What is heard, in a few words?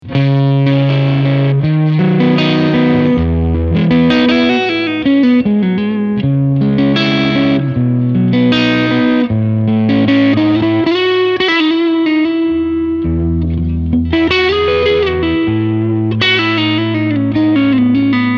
Fender Tone Master Twin Reverb Blonde Full Volume Vibrato Channel